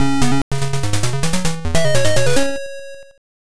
Bonus; Aleste 2; MSX2; PSG

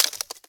climbing ice; Scrat

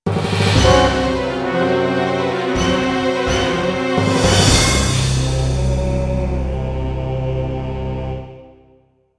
Mission Failed (SC-88); Extreme Assault; MS-DOS

